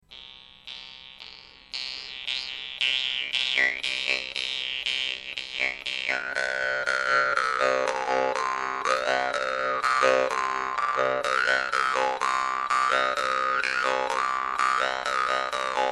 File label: vargan kapla; ustinov